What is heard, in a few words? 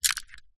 leisure toy game water ring toss handheld button press